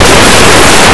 box

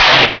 ion hit; KATANA; Daikatana

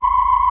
Beam, Guns; PaxImp; PC Gamer 50

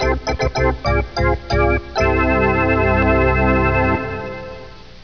TADA

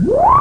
BLIP; SpaceOrb

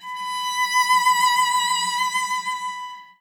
Cello soft B4; CM Strings

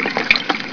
BUCKET

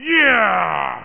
warcry; dungeonmaster

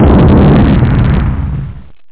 BOMB; chase

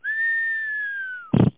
drop

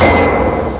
clang; music samples

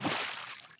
wet step; DAoC

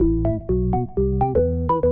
ORGAN HOUSE 125 A; eJay; Keys

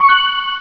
Get Bonus; BOOM

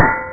sword; blackdawnii

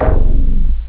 door, synth bass

